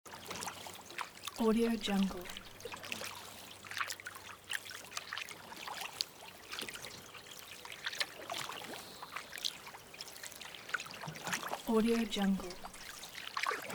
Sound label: small waves; elevaudio